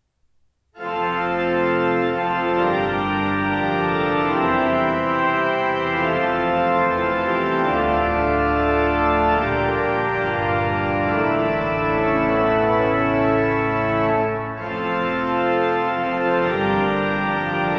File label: Fanfare; Bragernes